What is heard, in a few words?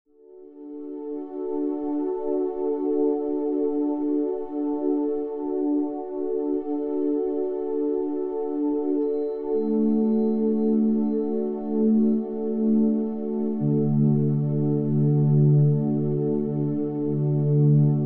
Soundscape; Deep Healing Series